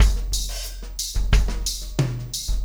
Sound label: Basic Beat